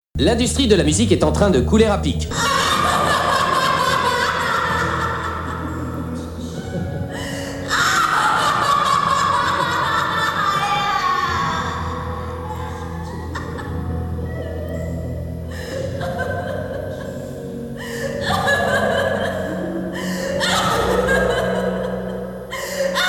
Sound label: screams for scratch